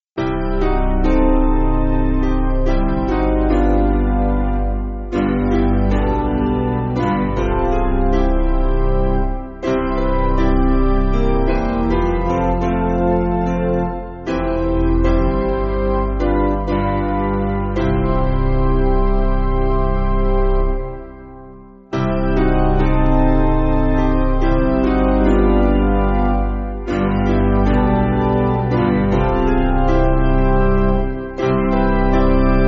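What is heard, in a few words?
Organ Piano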